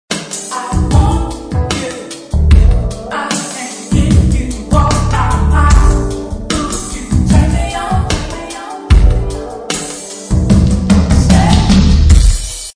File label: Jazz Sound